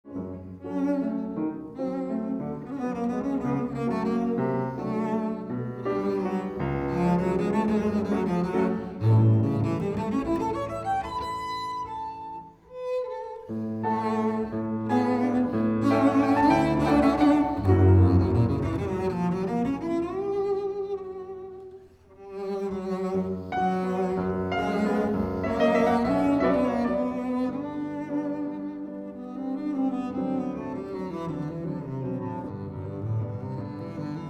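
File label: Allegretto, double bass; Bottesini; Rossini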